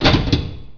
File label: metal Heavy On Metal end; vampire demo; environment